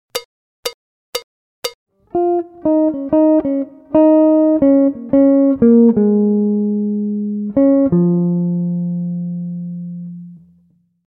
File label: Swing; Jazz Harmonia